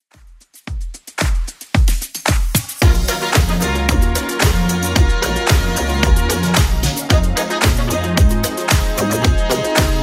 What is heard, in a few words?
If Youre Gonna Fall in Love 80s Club 112 bpm